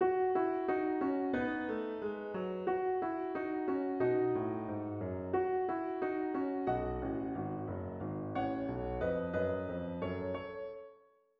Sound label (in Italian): Frammento per pianoforte